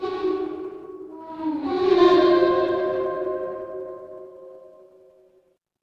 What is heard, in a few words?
horror creak